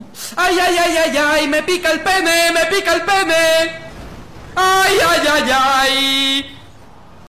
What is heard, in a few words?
ayayay